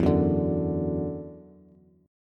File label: E 7th Suspended 2nd, down, Bass; E7sus2; strummed